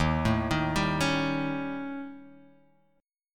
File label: D sharp 7th Flat 5th, D sharp, down, Guitar, Open G